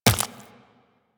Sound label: Run Flesh, Footsteps; Warframe; Tenno